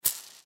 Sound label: Nut Unscrew Single Spin; Hardware; Machines; INDUSTRY